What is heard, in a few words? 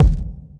short reverb bass drum C key